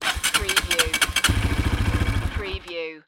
Motorcycle Dirt Bike Engine Turning Over

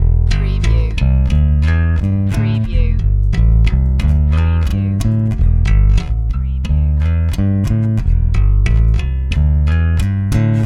Hip Hop Bass Guitar Loops 90 BPM - E

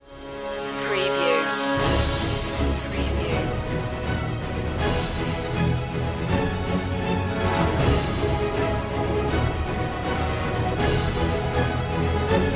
no choir

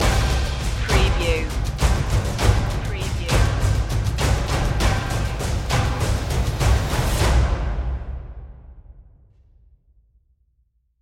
the brave shall rise perc stinger

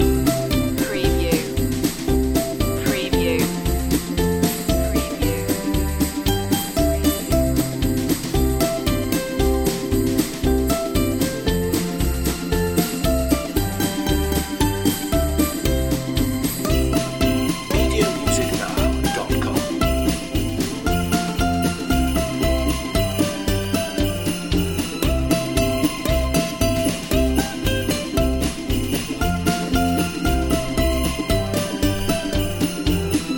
jingle bells ukulele loop

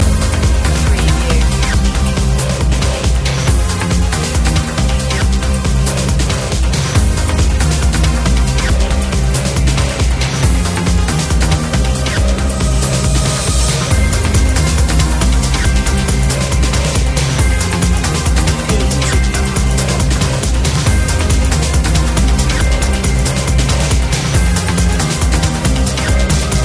thunder cat loop

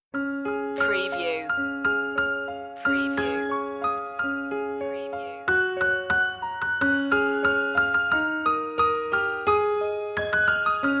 piano; jingle bells; media music now

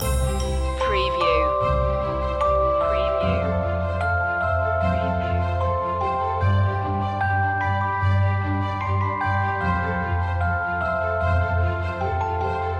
building blocks loop

